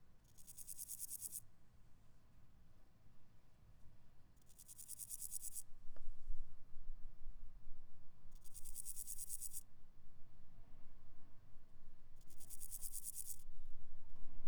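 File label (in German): pseudochorthippus parallelus, 31,4 Grad sonnig; steinebrunn daheim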